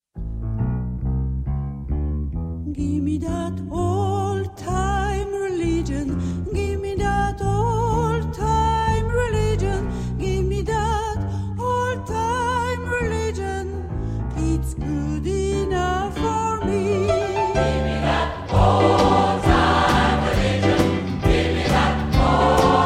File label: Old Time Rel, Gospel Choir; Rueil Malmaison; FRANCE